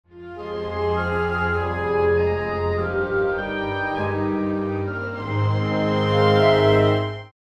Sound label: Miniature for Orchestra